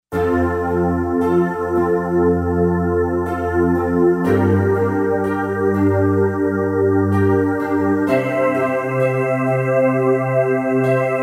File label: Heaven Piano; Roland SC-880; Factory Patches